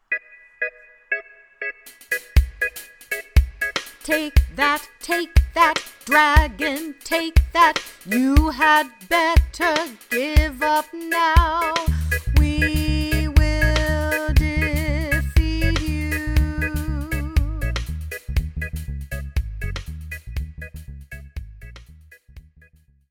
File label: vocals